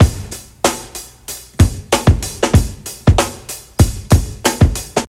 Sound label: 95 bpm drum loop sample f sharp key